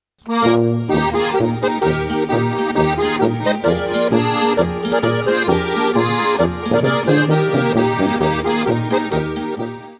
Aufi obi Polka

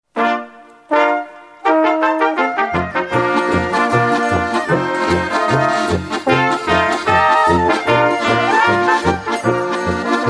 Polka; Pfeiferl

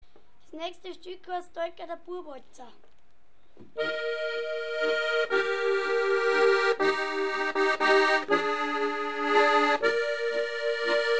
Walzer; Toalgerter Bua

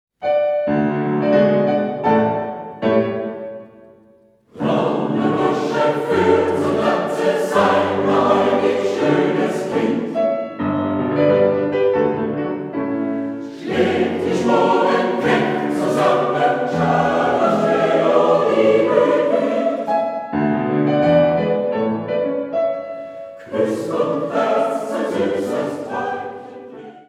Brauner Bursche, Kammerchor; Brahms

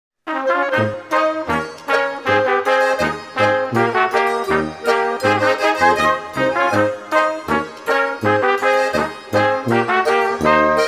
Boarisch

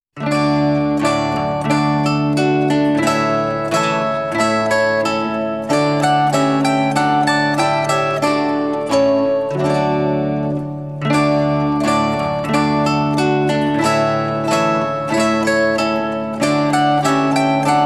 Marsch

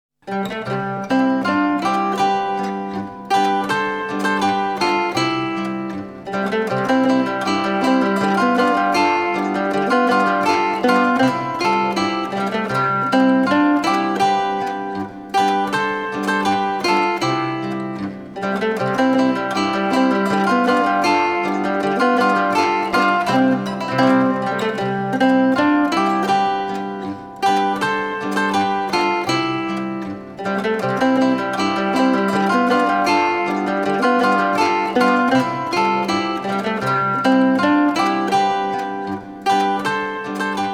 Boarisch, Zither

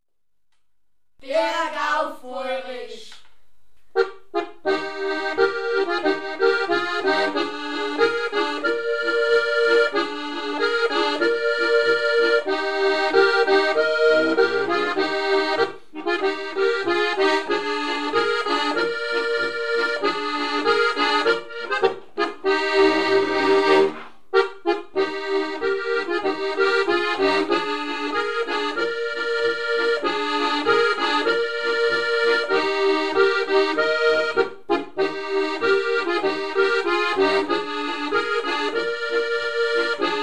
Boarischer

